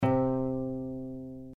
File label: harp, Harp; Real Samples